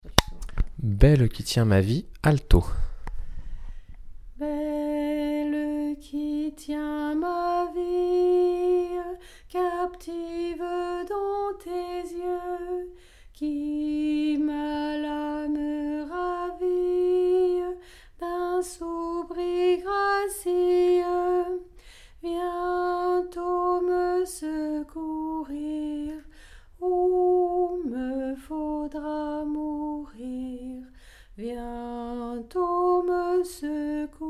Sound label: alto; Belle qui tiens ma vie